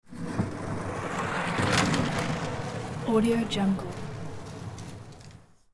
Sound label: matter mayhem plastic big container dragged; soundmorph